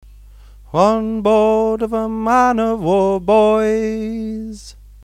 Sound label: Press gang refrain alto or tenor harmony sung; singing histories